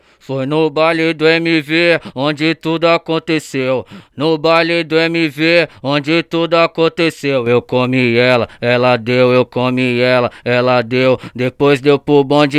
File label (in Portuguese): ACAPELLA